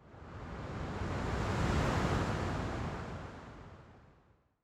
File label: ambient battle; World Of Warships